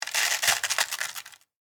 Coffee Maker Fill, Household, Coffee, Maker, Fill, Coffee, Grind; Appliance